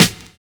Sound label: HORSE BEA; Univers Sons